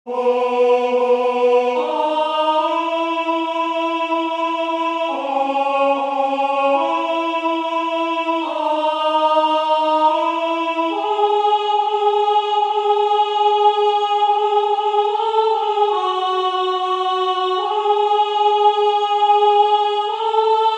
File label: star carol alto